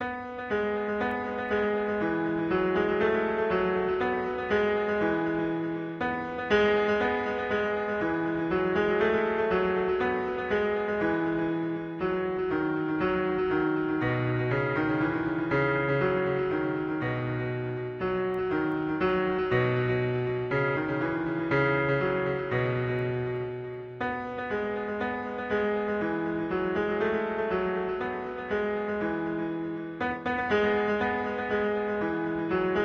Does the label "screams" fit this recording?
No